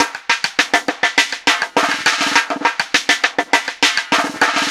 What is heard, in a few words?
Snare Particle, Processed Drumkits